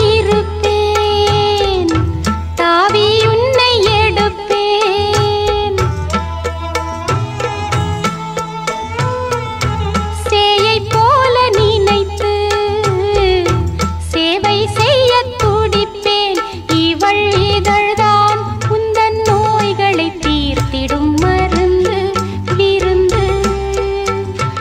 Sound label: new tamil song ringtone